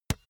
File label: basketball dribble tap soft; sport freizeit